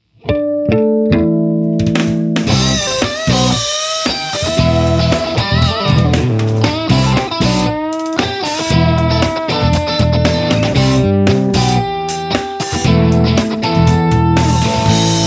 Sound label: bassdrumms